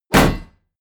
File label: hit shield metal; hits explosionen; metall